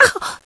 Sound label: pain; cstrike